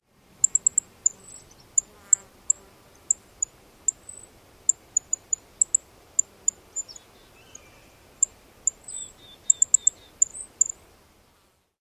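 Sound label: Cedar Waxwing high chips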